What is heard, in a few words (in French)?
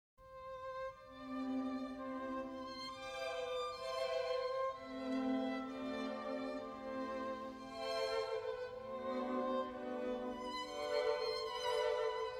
violon cordes